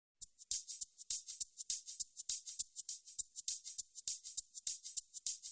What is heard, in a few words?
cool message